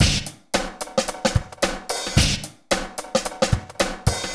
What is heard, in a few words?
HELP, LOOPS; Best Service; Hyper Dance